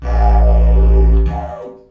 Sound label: Didgeridoo Kit Samples